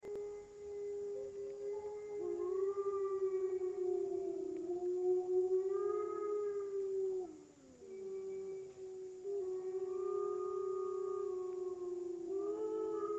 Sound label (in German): Wolfgeheul